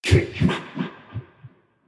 Heartbeat

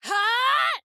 Charge

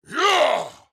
Attack Large; Black Adam

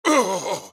AHurt; Black Adam

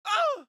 AHurt

